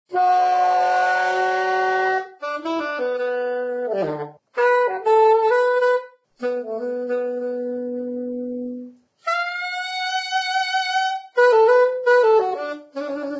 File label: Saxophone; Zomboid; Lifestyle